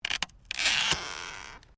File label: WOOD PLANK TWIST